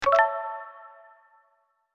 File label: Spin Wheel Button; My Cute Commissar